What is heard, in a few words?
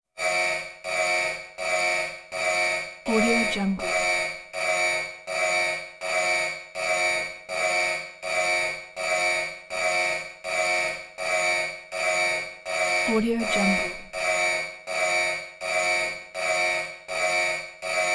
alarm synth klaxon mid range metallic warning; industrial sounds